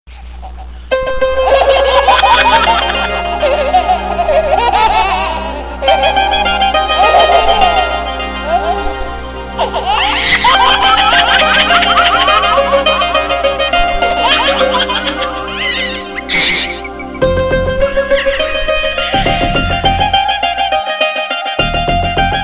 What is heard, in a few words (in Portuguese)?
bebe vs guitarra